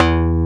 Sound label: FM Synth Bass, Roland; Club-50 - Foundations